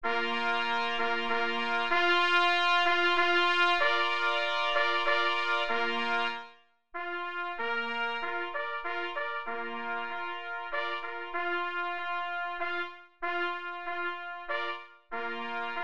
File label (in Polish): Menuet instrumenty jednorodne